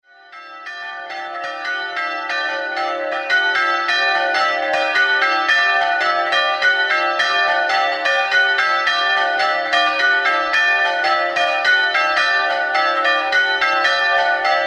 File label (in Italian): campane